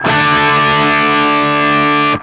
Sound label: 5TH D, Guitar; East West; Steve Stevens Guitar Samples Collection